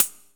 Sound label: CHINESE PRC, PERCUSSION